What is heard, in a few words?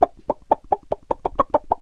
Chicken; fun and bullets